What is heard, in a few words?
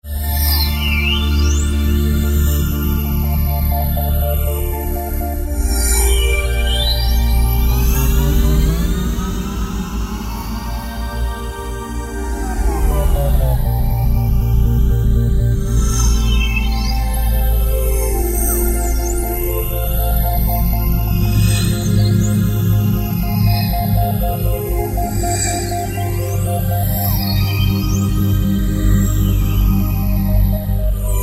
Roland JD-990; Factory Patches; Fourdimensional